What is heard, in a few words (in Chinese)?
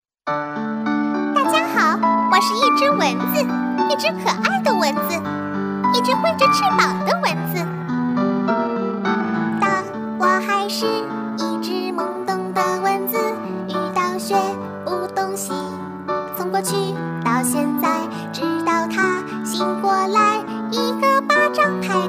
女 歌曲类, 儿歌